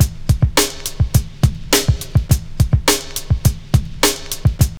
FUNKY 104 BPM, loop; 100-114 BPM

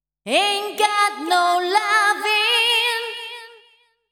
deep house female, 125, A, vocals

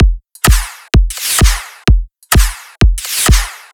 drum loop 128 Strp; Electro; drum loops; Anthemic

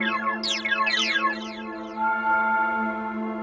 SPACE SYNTH 140 A; SpaceSounds

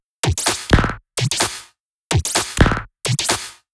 perc 128, Electro percussion loops; Anthemic

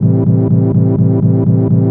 house synth 125 A, keys

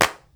CLAPS; CLAPS+SNIPS

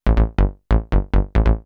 TECHNO BASS 140 A

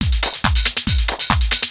TECHNO BEATS 140

